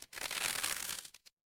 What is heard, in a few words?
paper fabric crumple